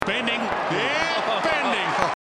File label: bending